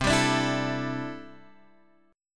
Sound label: C 6th Add 9th, C, down, Guitar, Open G